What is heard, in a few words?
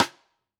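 Pearl Piccolo Side Snare On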